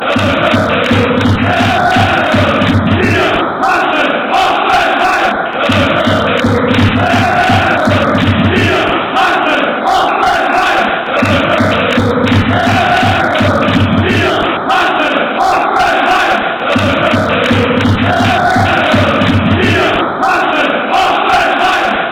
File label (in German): wir hassen hoffenheim fan chants